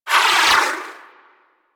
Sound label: Rocket Whizz By; Warframe; DE; Vehicles; RailJack; RailJackEnemyShips; Grineer; Space Fighter Dredger Agent